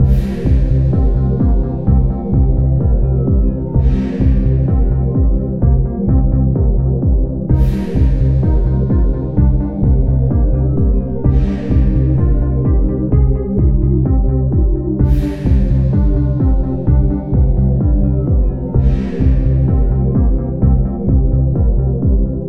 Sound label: videogame menu music; speed racer